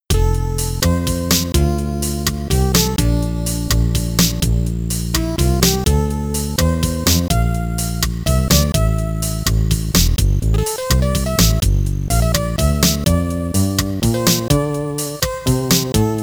Jukebox; beneath a steel sky; amiga; Longbeech

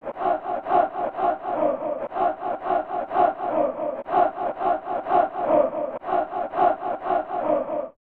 Crowd Chanting; snes; Kick Off 3

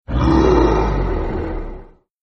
boss dead sound; Arc of Templar

